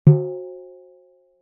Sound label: Cuica Hit; Percussion Samples